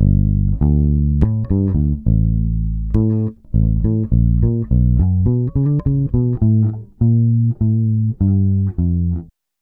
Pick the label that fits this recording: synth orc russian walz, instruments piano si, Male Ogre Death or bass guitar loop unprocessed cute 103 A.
bass guitar loop unprocessed cute 103 A